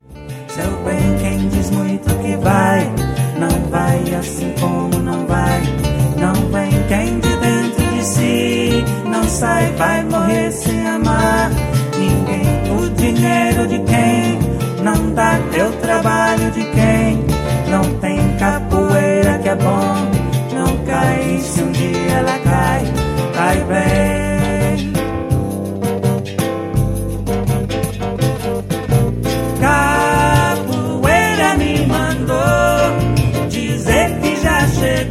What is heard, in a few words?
Berimbau